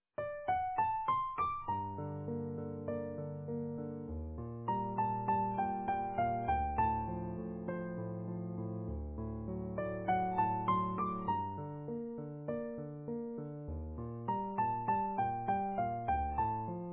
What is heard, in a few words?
Easy Piano